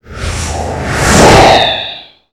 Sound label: Heavy object swooping by